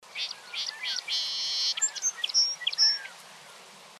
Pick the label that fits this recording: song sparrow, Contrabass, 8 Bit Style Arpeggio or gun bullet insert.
song sparrow